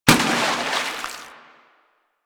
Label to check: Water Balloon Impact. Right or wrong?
Right